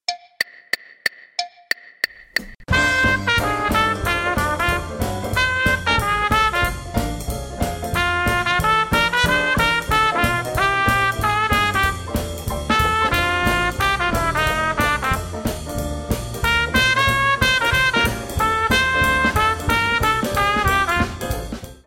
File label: Trumpet easy